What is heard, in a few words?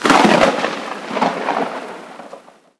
thunder attack; monsters; svencoop; AoMDC; davidbad